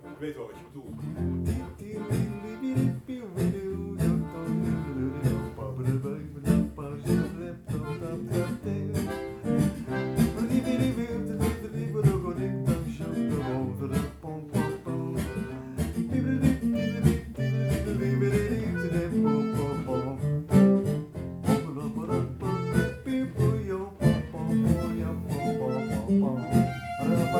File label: solo; BO1 Binnen- en Buitenband